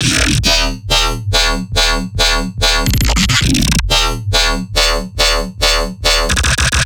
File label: Riddim Bass Loop D# Rendering 140; Bass Loops; Ghosthack; Riddim Essentials 2020